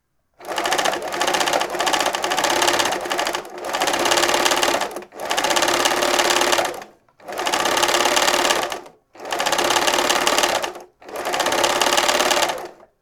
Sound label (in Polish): Maszyna Do Szycia Dluzej